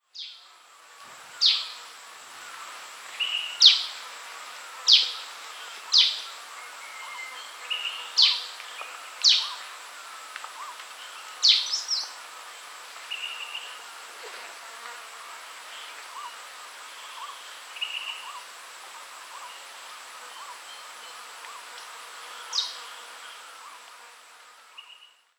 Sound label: Rufescent Prinia Prinia rufescens beavani, rufescent prinia call